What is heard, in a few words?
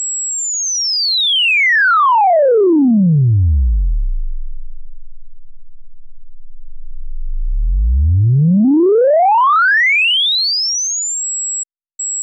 Hermod LFO